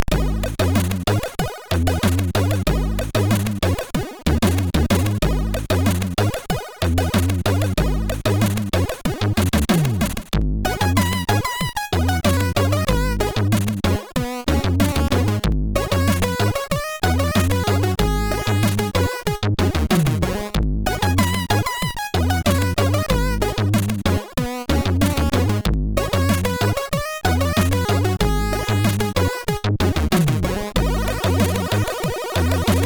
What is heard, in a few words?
Quick Funk, SIDTunes; Worktunes